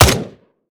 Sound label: akilo47 smg; gmod; viper